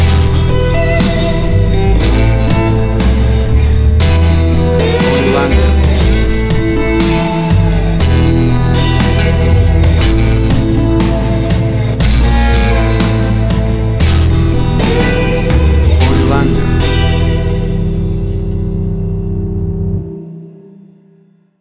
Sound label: Blues From Yesteryear